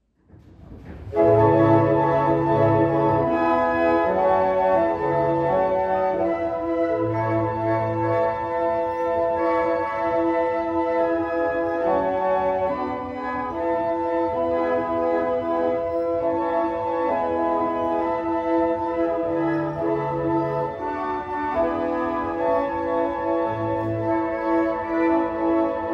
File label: Old 100th, barrel organs; Norton